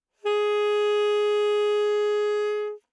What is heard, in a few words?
Alto Sax No Vib ff Ab4, Eb Alto Saxophone; Woodwinds; MIS Pitches - 2014